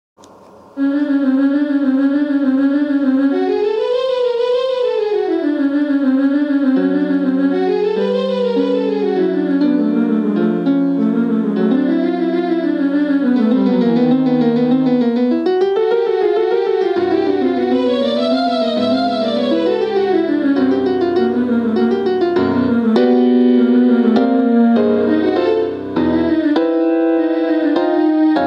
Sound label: Viola